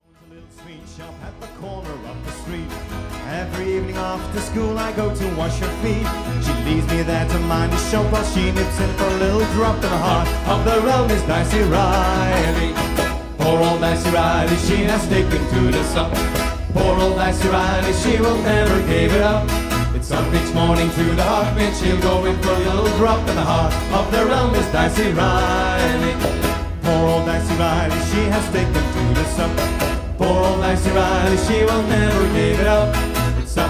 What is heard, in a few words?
Polka